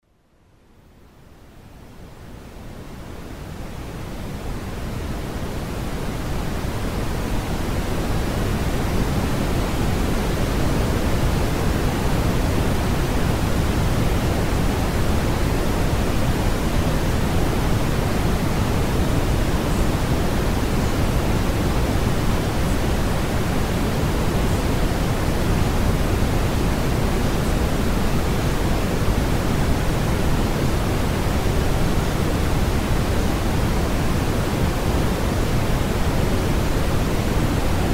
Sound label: Brown Noise